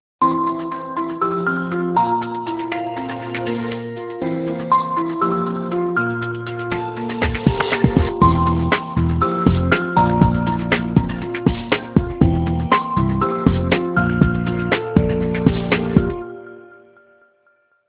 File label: MIDI; Okapia; Signature L